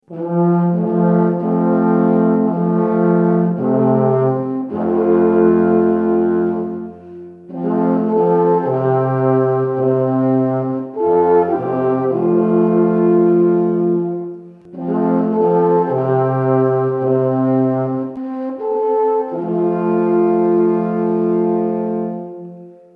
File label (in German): Alphorn